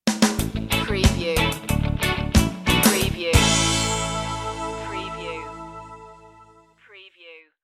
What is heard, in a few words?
reggae stinger